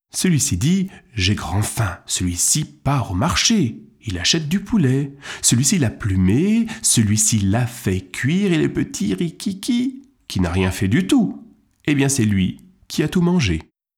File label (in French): Celui-ci dit, jeux de doigts, kids